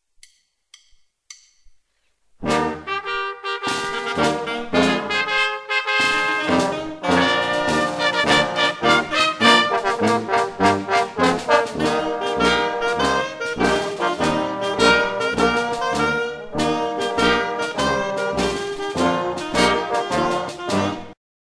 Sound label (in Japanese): suisougaku